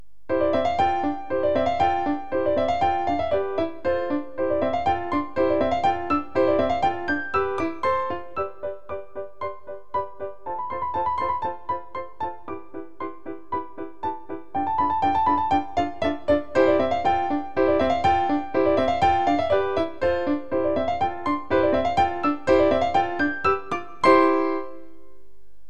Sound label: Gallop